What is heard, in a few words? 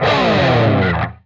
PRS SLIDE, guitar